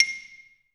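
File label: XY LONG C6, xylophone; Best Service; Peter Siedlaczek's Advanced Orchestra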